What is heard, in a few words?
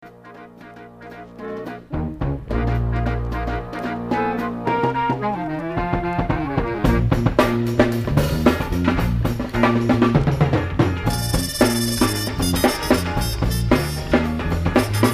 drum